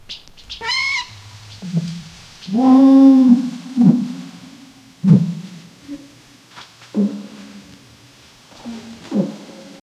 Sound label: elephant groans and slow, jungle